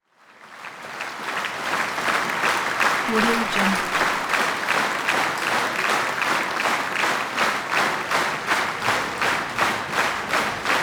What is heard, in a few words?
concert hall rhythmical applause light talking